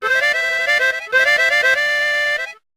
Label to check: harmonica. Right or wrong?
Right